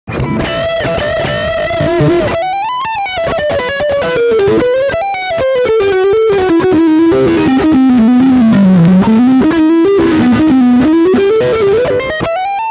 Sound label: Neck Humbucker Distortion